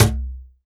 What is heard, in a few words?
MIXED DRUMS, World Instruments; Best Service ProSamples vol.52 - World Instruments 2; AKAI